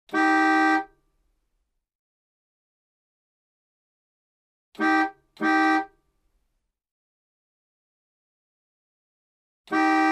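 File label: Car horn sound, klaxon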